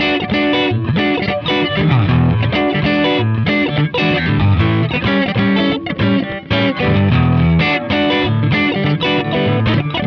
Verse Partial Progression Boogie E; Loops

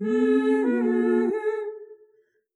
Soft Soulfull Harmony

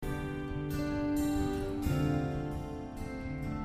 Picking D 71

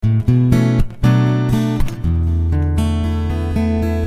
Punchy Bm7,Esus7 120

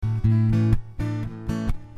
Punchy A 67

